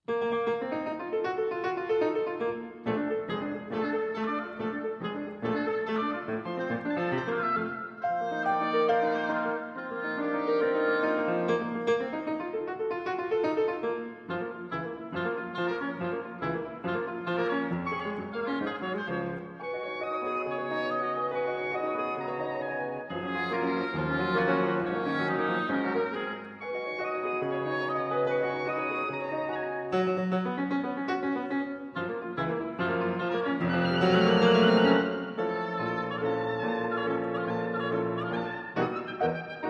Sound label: Oboe Sonata, Scherzo